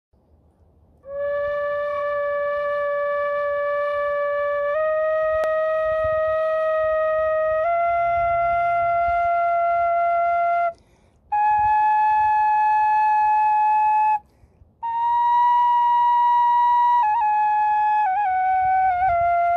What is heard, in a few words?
Ney Labak